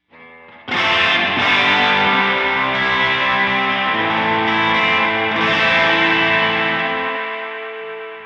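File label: Spring Electric Guitar; LRG TENSION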